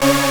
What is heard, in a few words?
synth; osu